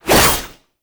Whoosh, Melee; Warframe; 1999 Skana

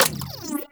Shotgun Reload, Weapons; Warframe; Corpus

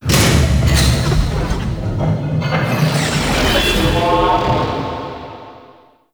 Vaults UI Hack Completed; Gameplay; Warframe; Entrati

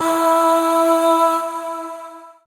Void Shawzin Oct Two E; Warframe; Zariman

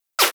Revolver Pistol Whizz

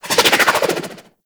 SMG Projectile By; Warframe; Tenno; Sundial SMG; Weapons

